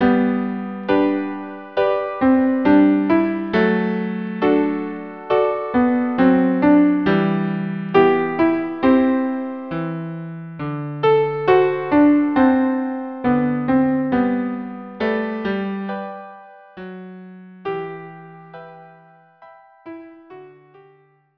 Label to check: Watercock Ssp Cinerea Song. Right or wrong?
Wrong